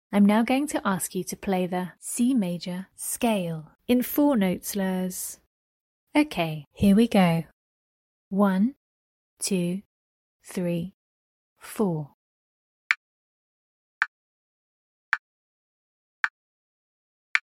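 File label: C major scale 4 note slurs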